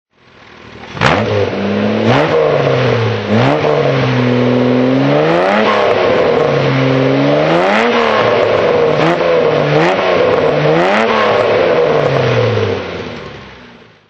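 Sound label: jaguar e type 42ots s15 throttle, Engine sound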